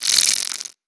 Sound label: poison shoot warning